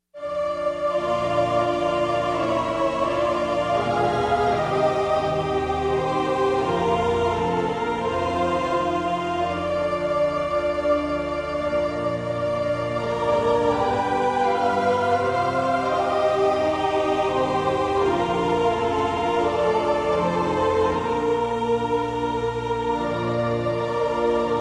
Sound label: O little town Bethlehem Choir; Christmas